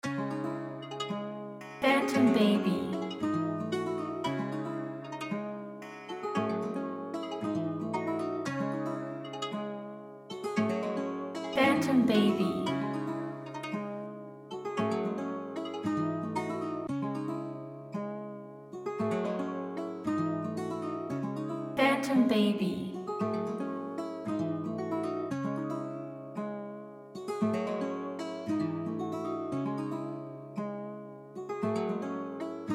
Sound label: Melodica